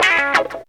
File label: GTR RIF; E-Lab; X Static Goldmine 2; akai